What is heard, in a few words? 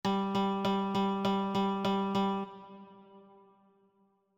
Rhythm Notation Guide Eighth Notes